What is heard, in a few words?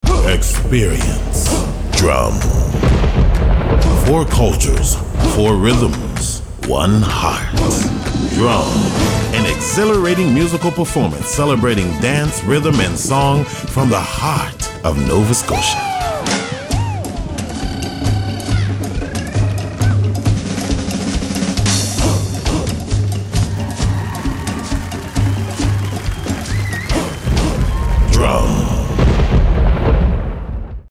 DRUM 30sec